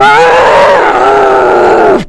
zombie infect; zombie plague; cso

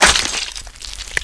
knife hit, weapons

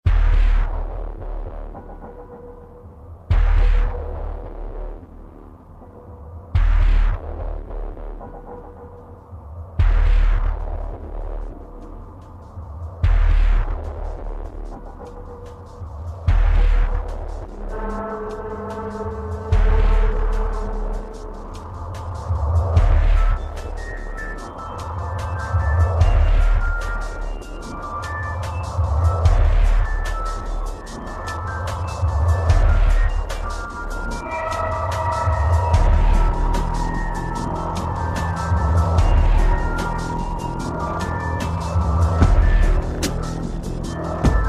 action ambience; zombie plague

